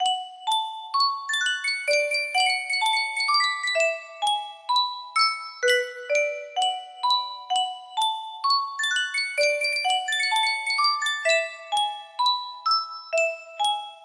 yunsheng music box

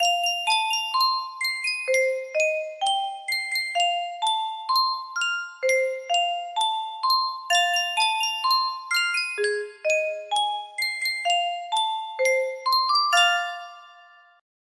music box

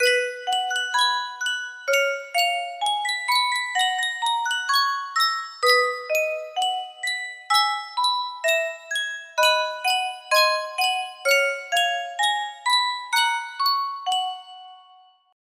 music box

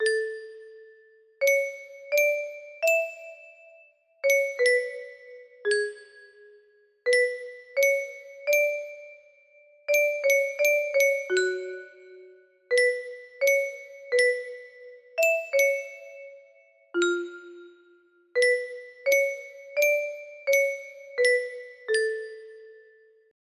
music box

